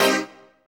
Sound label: HOUSE, House Dance